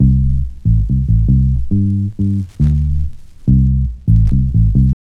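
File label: bass reggae loop; AMG; Now CD-ROM (Roland)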